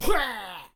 Goblin Injury; SyrinScape

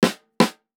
Cartoon Drum Snare Tap; Adobe; TTRPG

